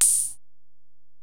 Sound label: HiHat, Alesis DM-PRO